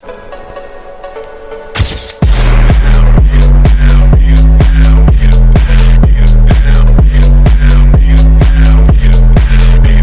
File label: boombox phonk